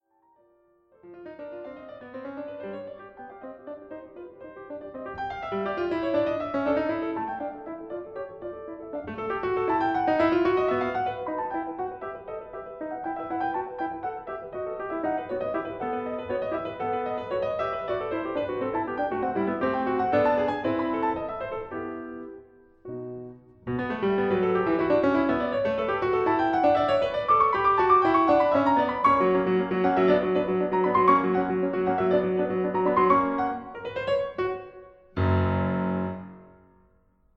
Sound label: Piano Sonata B Minor Presto; Woelfl; Op28